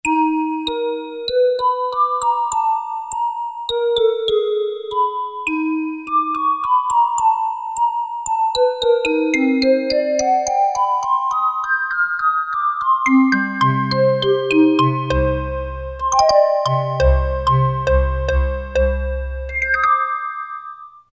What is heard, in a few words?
Fantasy, Casio MT-600; Tones